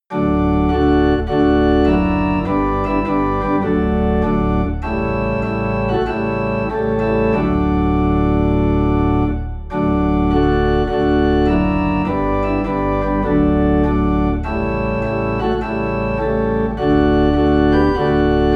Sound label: Organ Low in D; Tell It to Jesus